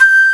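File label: woodwind G3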